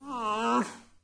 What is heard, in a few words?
Llama Mom Idle